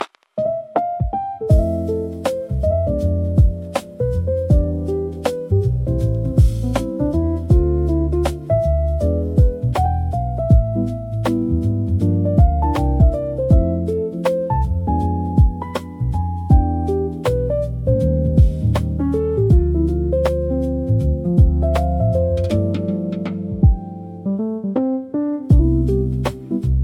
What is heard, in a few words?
music sample